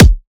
Riddim Kick

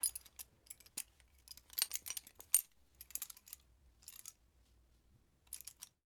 Butterfly Knife Misc Movement; Fairlight Sound Lib

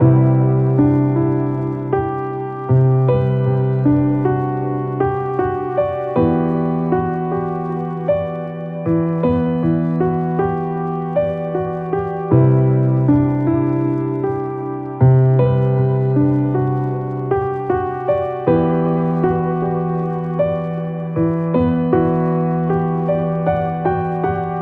Kit Piano Em 78, Lo-Fi; HipHop; Melody Kits; Loops; Ghosthack; AC2024 - Day 5; Kit Memories

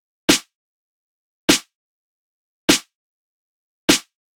Snare 100 Crackled; Drums; Separated Drum Loops; Snares; Ghosthack; Drum Mega Bundle; Abstract Drums Volume 2

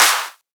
Riddim Clap

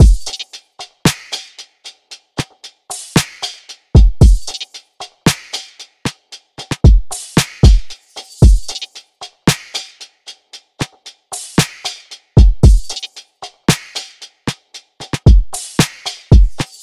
Drum Loop 114 BPM; Toast; Cymatics; Vibes II Drum Loop Collection

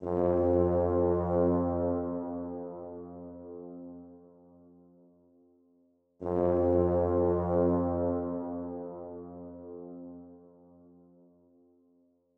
155 BPM F Min Brass; Cymatics; Domination

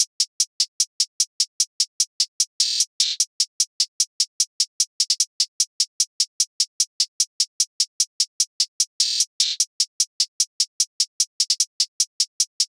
Hihat Loop 150 BPM; Konopio

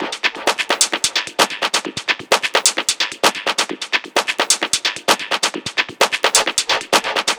Perc Loop 130, techno; Loops; Percussion; GhostHack; Ghosthack - Free Techno Sounds 2024

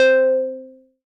Juno 106 PLUCK Cute Low - C; Synth; One Shots; Cymatics; Fantasy Synth Sample Pack

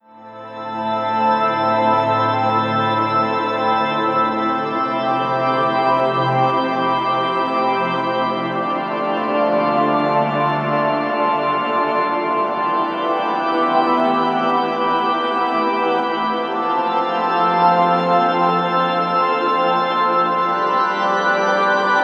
Organ - Am 60BPM; STEMS; Ghosthack; SkyRealm; Mood Kits; Mood Kit 08 - Shining Light

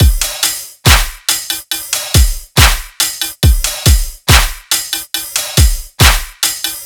Drum Full 140BPM, dubstep; Ghosthack; AC2024 - Day 13 - Dubstep Sounds 2025

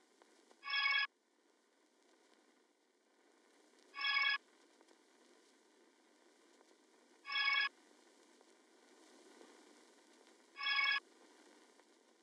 145 BPM C# Min Accent; Melody; Trap; Loop Stems; Cymatics; 2022 Melody Collection; Ferrari

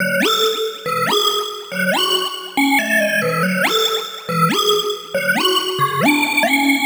Riddim Synth Loop F# (140); Spoiled; Ghosthack; Riddim Essentials 2020

